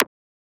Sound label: Transient; FLPRO